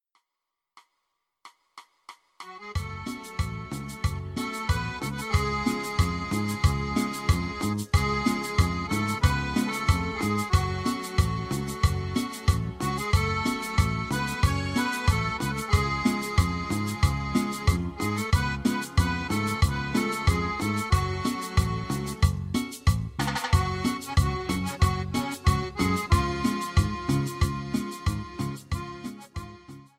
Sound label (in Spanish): Cumbia Borracha; ritmos